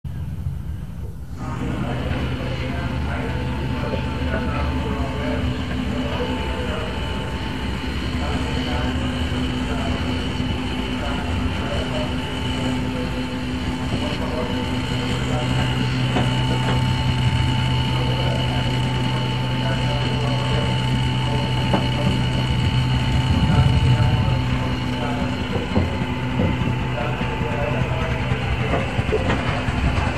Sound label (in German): Lokgeraeusche; Aalen